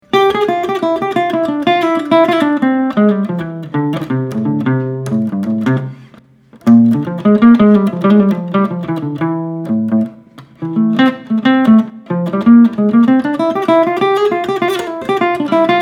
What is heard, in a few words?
Jazzy Lines